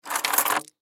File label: Key Ring Pick Up; Key Ring Pick Up Table; Foley; Blastwave